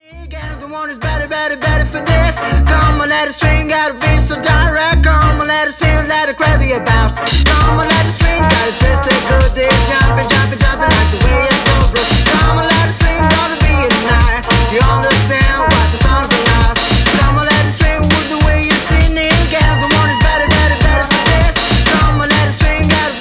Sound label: lyre